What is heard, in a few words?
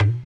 Conga; Equipped Music; Loungin House